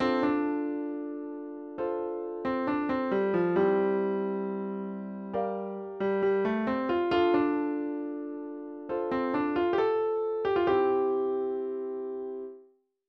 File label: guitar solo